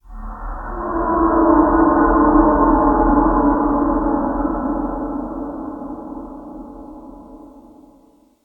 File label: Distant Pod, Ambient; Barotrauma; Room FX